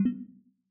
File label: dodoo noclick